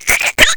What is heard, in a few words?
pain; faerie